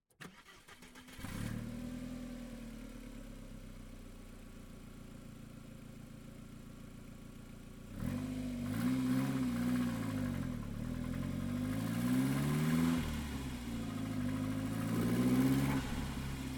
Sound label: Toyota Endurance Race Car - ONBRD - SLOW - Start Drive Stop Reverse Stop Drive Stop; ENGINE; with Audi Engine; DPA4061; Pole Position Production; GDC 2023 - Game Audio Bundle